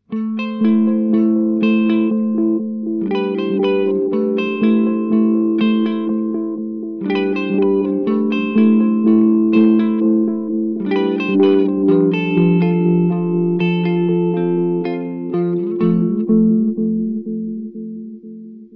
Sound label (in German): Flaechige Delays Chase Bliss Audio Tonal Recall RKM